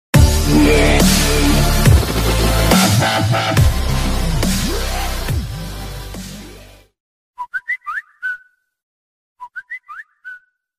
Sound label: original whistle ringtone; samsung